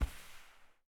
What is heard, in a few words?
crouch slide